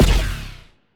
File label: mandible hit, weapons; Stellaris; biogenesis